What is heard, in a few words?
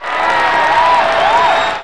win